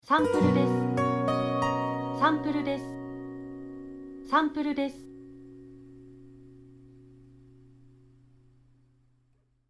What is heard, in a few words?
Logo Guitar